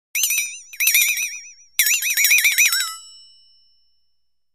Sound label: Bouncing Twang ( Metal ); Descending Bouncing Multi-pitched Metallic Twangs.